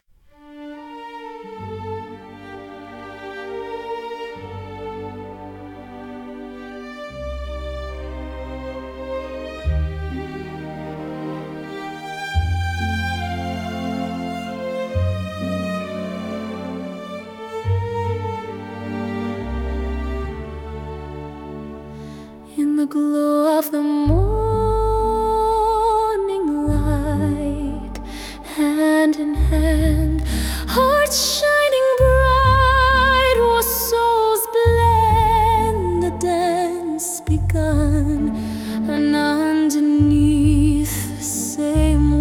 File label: wedding song